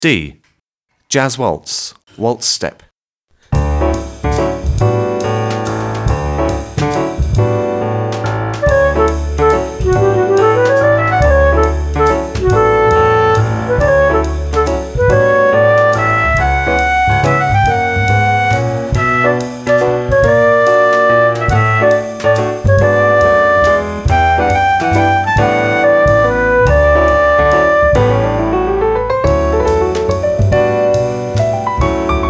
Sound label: Compound Step D (Jazz Waltz)